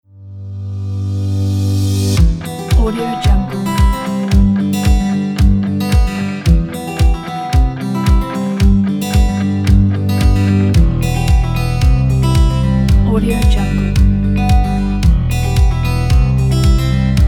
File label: happy kit